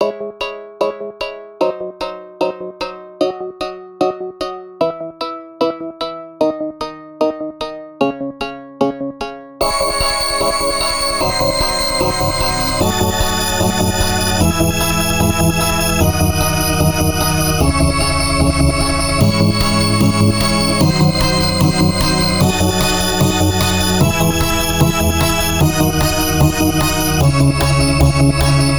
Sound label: banjo; banjo inquisition; 2021 Eclectic Music Pack